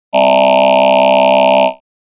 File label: Voice aah